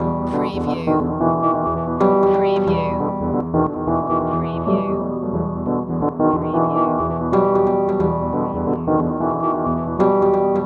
Hip Hop Dark Rhodes Licks 90 BPM Swing - E

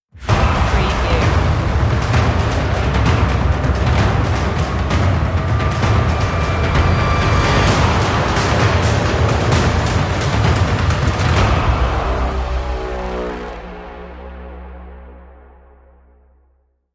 industrial impact stinger

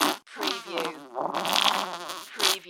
Poop Fart

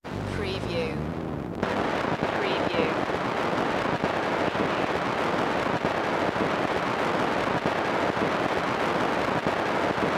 end of record noise

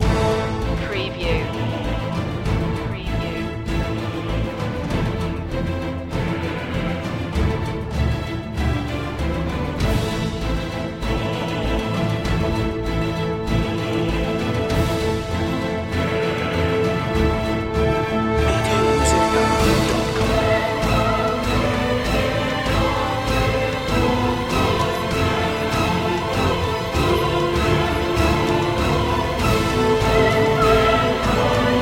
full mix

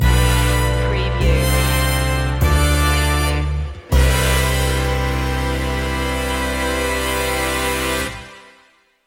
big band romance loop end

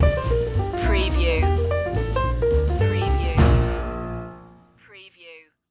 slim jim stinger

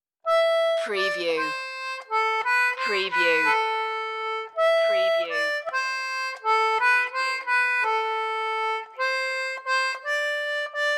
we three kings solo concertina

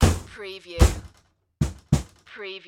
Ambulance Doors Close; sound effects bible

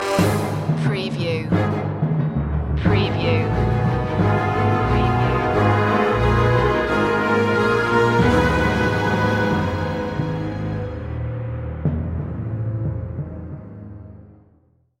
orchestral action

